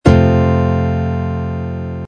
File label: f major, guitar